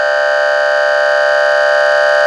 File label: horn; Tomar Electronics